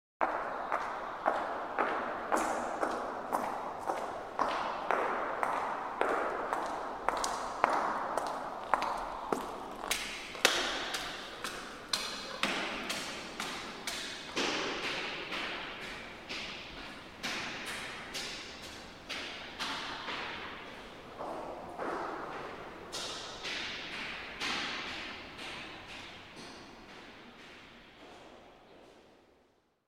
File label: Footsteps By In Echoey Stairwe; Hollywood Edge Historical Series; Traffic Free BG's & FX